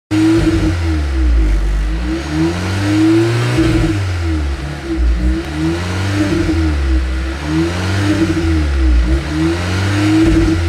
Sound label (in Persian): Tucson, ماشین